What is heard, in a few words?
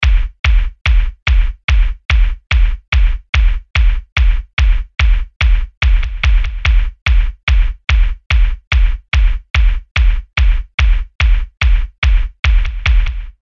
Slab Kick; Industrial